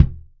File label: kicks soft